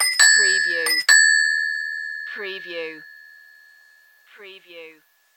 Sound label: Bell Bicycle Two Tone